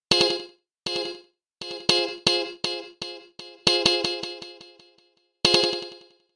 Delay Time

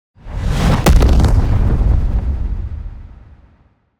Stinger with gap

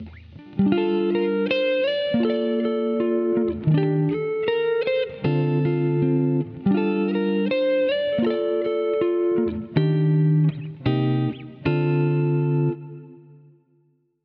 GUITAR; antelopeaudio

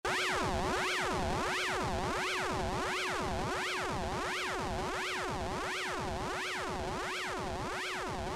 modulations

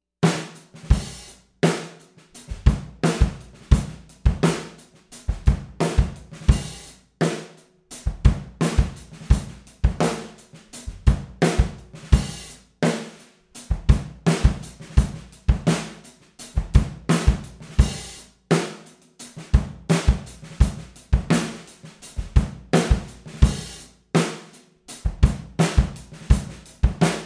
drum; warmaudio

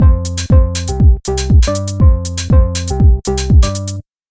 Bass Before

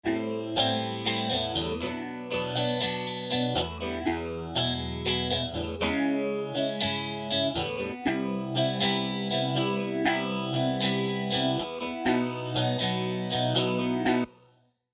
delay fx

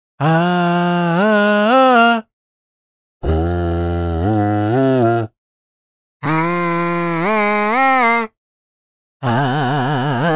Vocal Bender; waves

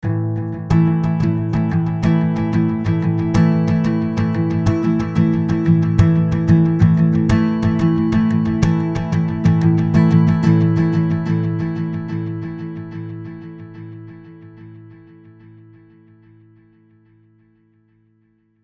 guitar ping Pong; waves J37

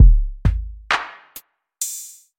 Battery Sounds

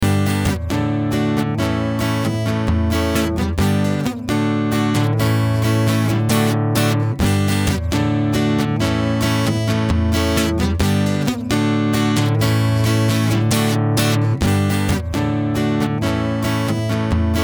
acoustic guitar compression; waves

